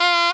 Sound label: Saturn Honk; TheChaseBuzzer